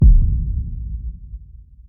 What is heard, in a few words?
power Click